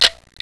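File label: Bessa-R, camera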